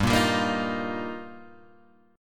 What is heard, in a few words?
G Minor 7th Sharp 5th, Guitar, Open G